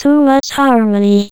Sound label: MUCH ARMONY, Funky Vocals; USB Soundscan vol.18; AKAI; COMPUTER